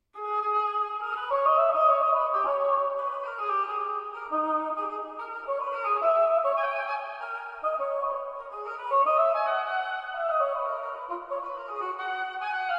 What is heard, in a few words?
Suite in A minor Courante; JS Bach